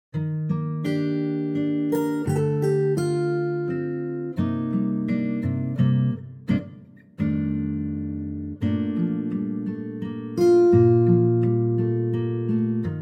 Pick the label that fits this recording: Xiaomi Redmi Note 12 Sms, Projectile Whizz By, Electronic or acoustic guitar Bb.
acoustic guitar Bb